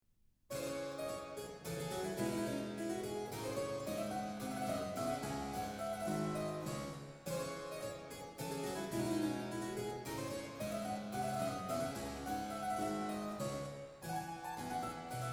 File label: Hornpipe Suite for harpsichord in D minor; A Choice Collection of Lessons; Z668; Henry Purcell